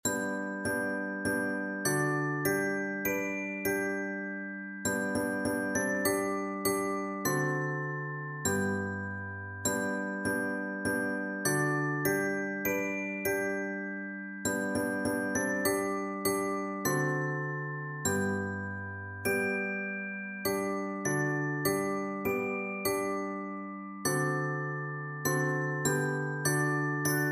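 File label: blood of jesus, glockenspiel; percussion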